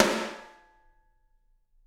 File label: SD, Drums; Bob Clearmountain Drums I